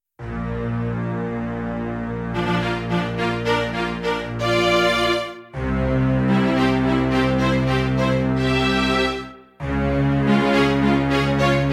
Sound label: Dramatic Entrance